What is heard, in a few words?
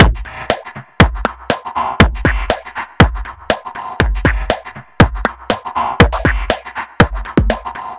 Stack Back Beat, Drum Loop; Sonic Foundry; The Electronic Music Manuscript Collection; Drum Loop Structures